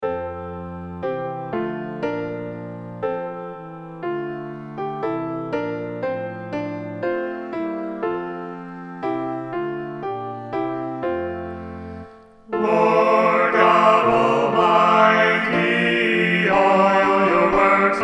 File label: choir; LordGodAlmighty